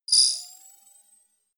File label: sci-fi menu open light